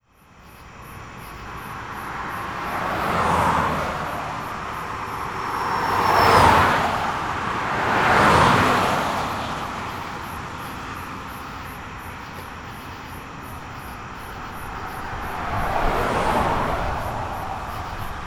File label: cars passing